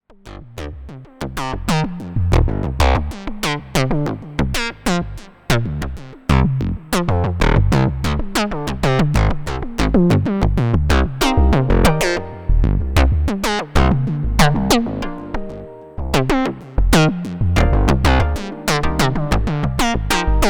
solo damp pitch Chord harmony cv, 32 Voice String Synth Eurorack